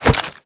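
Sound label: melee attack gun medium; viper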